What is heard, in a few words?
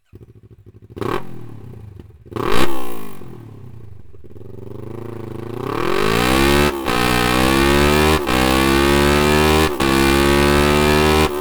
akrapovic racing exhaust system kawasaki ninja 650 z650